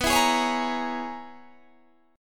Db Minor 13th B

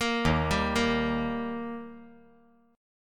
Eb Major Eb down 5G,4C,5E,5A; arpeggiated; JGuitar

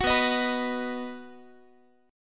B Major, Guitar, 4A,4E,5A,5D,5G sharp,6C sharp; strummed

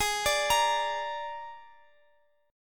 G sharp Suspended 2nd Flat 5th, Guitar; G#sus2b5; arpeggiated; JGuitar

